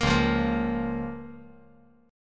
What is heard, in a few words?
Db 6th; Db6 strummed; JGuitar